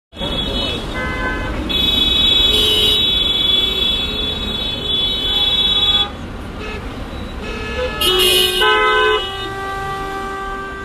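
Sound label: car traffic jam car horns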